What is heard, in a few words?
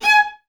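violas G#5 staccato, String Ensembles; Miroslav Vitous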